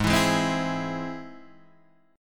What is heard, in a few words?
Ab Augmented Ab Guitar Standard; Ab+ strummed; JGuitar